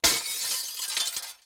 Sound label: glass break; Blind Drive